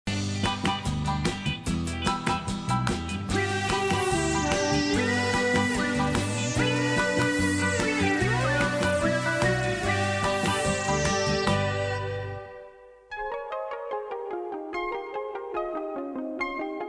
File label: Heavenly Instrumental